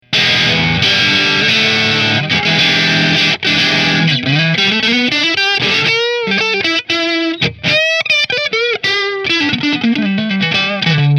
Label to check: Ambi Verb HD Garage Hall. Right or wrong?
Wrong